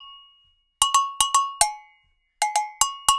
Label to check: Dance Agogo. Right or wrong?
Right